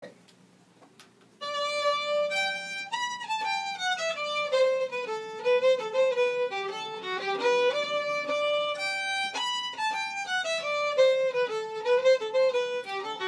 Polska In G; Polska Fran Grëmark

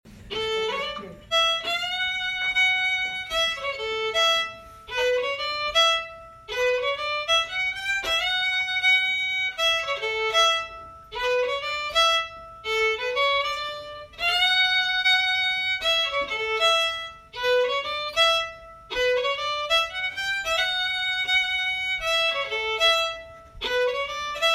jig

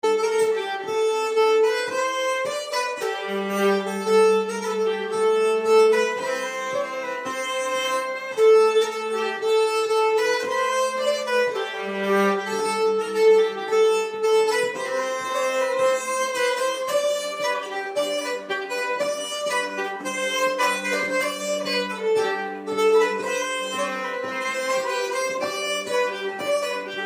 nyckleharpa tune; finalen

